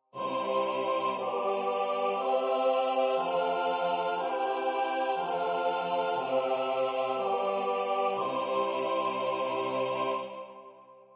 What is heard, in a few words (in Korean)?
주3화음 전체